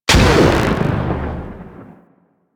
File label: Plasma Grenade Explosion; Warframe; Venus; Venus Grenades